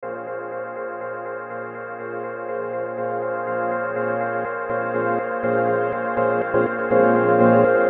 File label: Loop